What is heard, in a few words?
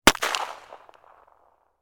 weapon gun ruger single six; still north media